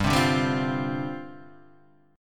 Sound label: G 7th Suspended 4th G